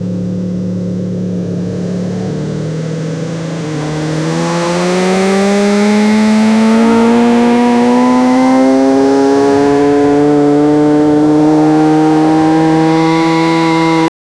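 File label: motorcycle engine 1000cc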